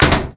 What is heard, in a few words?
bounce, slam; weapons